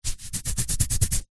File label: human itch skin; zapsplat